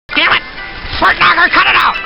fart

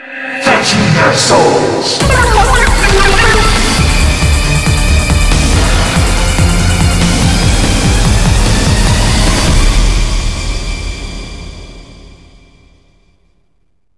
retro intro; slender; isabelle

